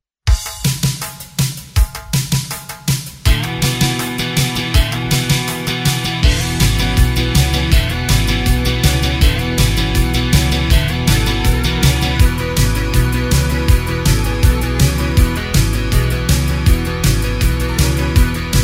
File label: Instr